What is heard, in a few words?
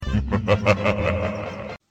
evil laugh